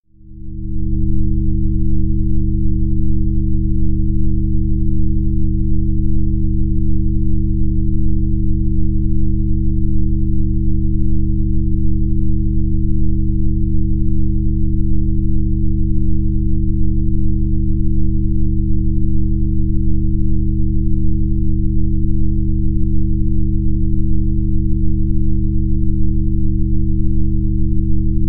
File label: Brainwave Balancing Low